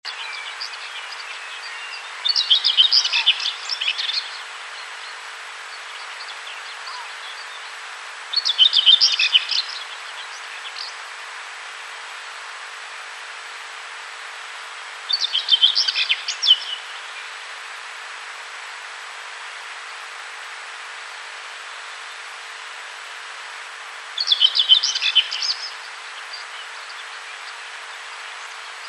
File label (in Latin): Sylvia communis